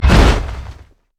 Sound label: creeping death; Mordekaiser